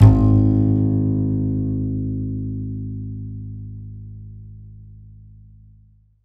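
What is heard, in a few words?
double bass G#1; Univers Sons; Jazzistic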